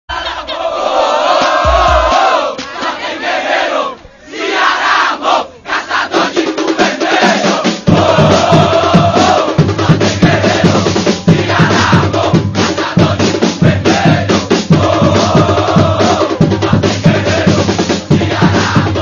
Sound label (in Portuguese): bateria torcidas